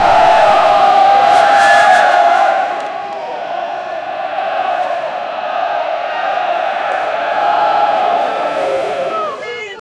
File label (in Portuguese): torcida de futebol gritan; crowd